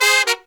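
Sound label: Pop & Funk Brass, 110 Ebm, right; Best Service ProSamples vol.25; AKAI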